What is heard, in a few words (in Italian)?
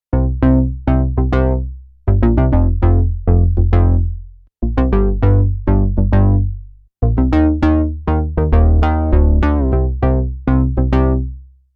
BASS, synth bass anni '80; Arp-2600; Roland Jup-8; SH-101